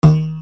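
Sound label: percussion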